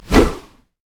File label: basicattak; LoL; fiddlesticks